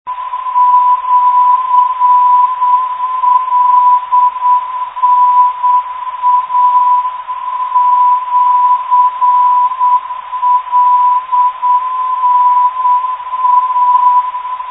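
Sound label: lna 313 005khz, non-directional beacon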